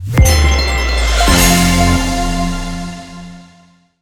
big win; silverback

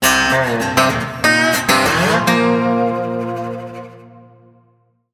large Win Riff; dead or alive 2

